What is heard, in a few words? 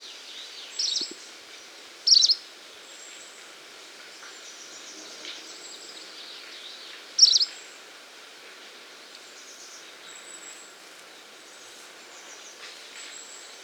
plumbeitarsus call